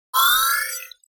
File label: Digital text fade in